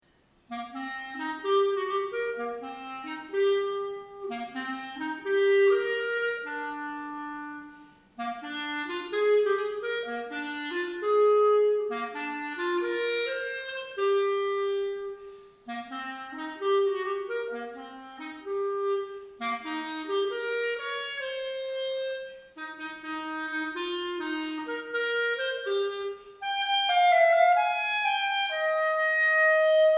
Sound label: clarinet